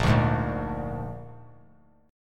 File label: Bb 7th Bb down 3C,3F,3A,3D; strummed; JGuitar